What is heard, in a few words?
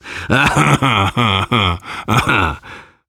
laughter, survivor voice biker